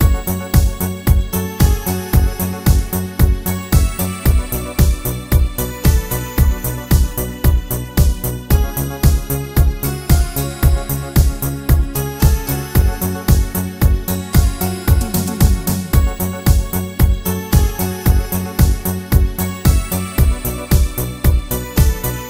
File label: Improvisation